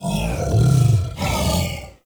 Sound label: Juggernaut Found; Infested; Truffle Hunt